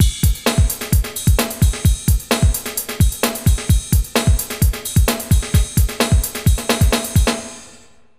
130 Percloop

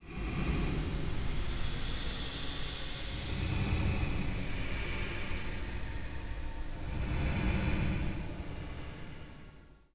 Strange Breathing

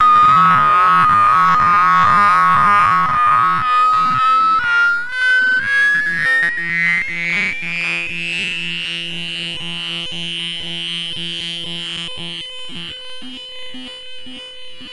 Boing

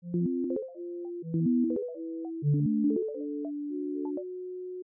Calm Ringtone

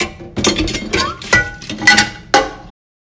Dishes

